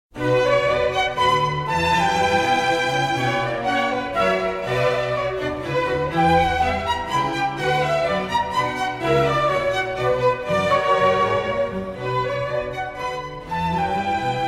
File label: Minuet Orchestral Suite No2